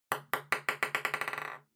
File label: Drawing Box Ball Put